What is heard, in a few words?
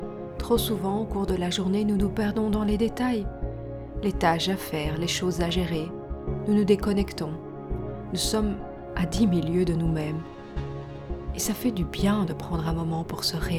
music sample